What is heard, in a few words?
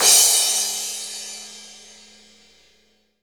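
crash cymbal, Real Kit; 90 s Sample CDs; Sound & Vision - Gigapack I CD 1; Real-Kit s 4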